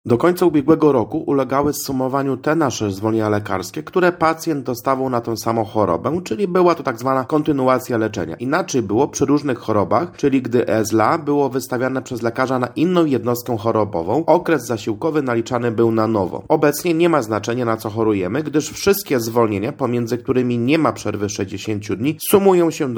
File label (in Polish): chor